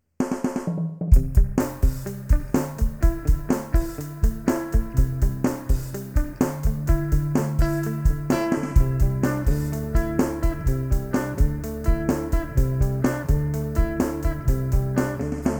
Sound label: Raindrops